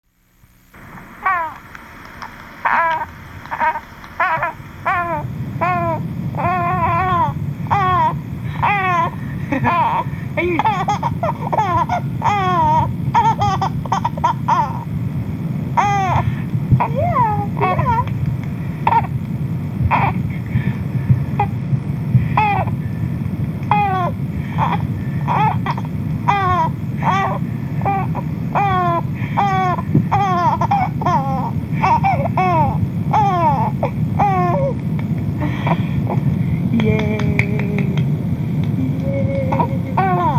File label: laugh